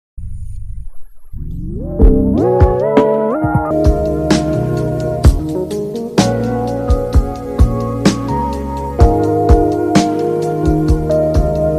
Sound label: flute